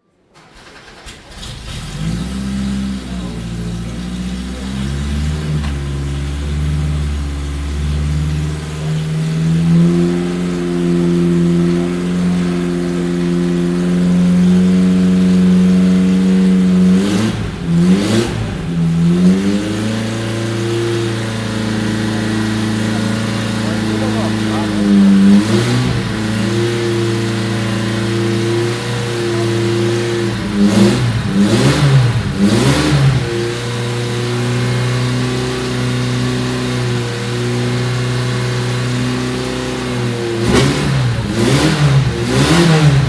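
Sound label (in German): Porsche 356 B 2000 GT Carrera GT, Porsche 356 B 2000 GS Carrera GT - Starten und Hochdrehen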